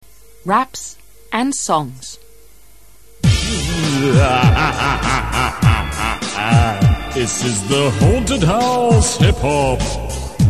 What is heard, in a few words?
haunted house hiphop